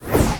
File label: WHOOSH Air Slow Mono